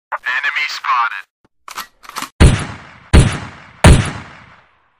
enemy spotted gunshot

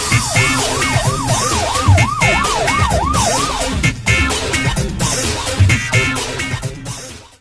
goal horn; ssl

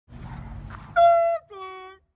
klaxon; sncf

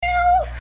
meow

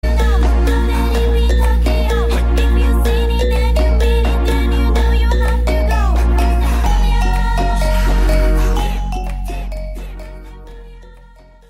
trap remix

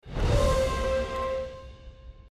cheer; bdo

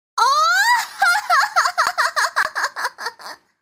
ojou sama laugh; amelia watson

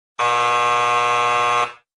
wrong answer

